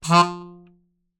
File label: Melodica Short Note